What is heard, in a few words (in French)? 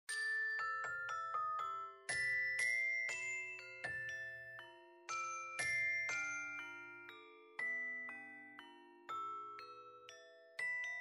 boite